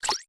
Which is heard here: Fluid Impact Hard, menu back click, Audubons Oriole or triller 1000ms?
menu back click